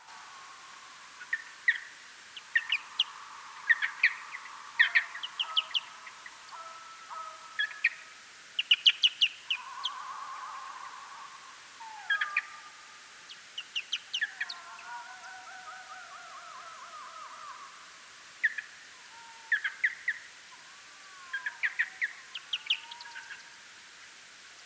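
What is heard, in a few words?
Pipistrellus pipistrellus, bat; Boehm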